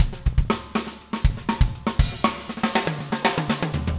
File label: funk 120 bpm, loops